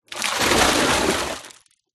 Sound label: nature water pour into deep water